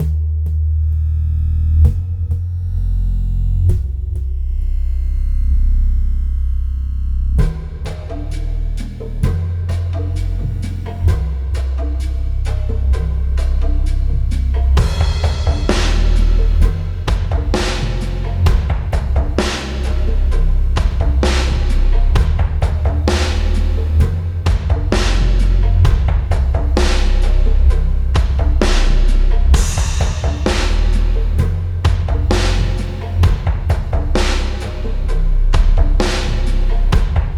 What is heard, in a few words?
music level; Freedom Fall